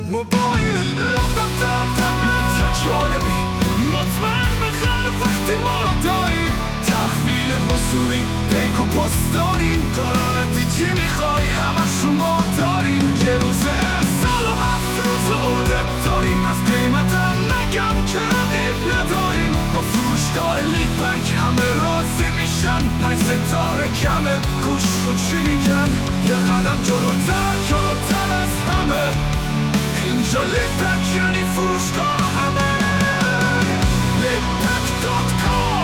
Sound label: rock metal; Lipak